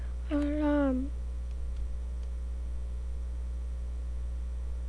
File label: alarm